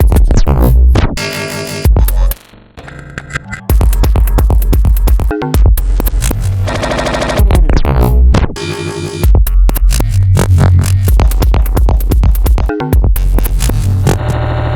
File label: beat